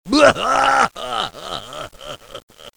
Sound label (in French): Baoau ah ah ah, Rires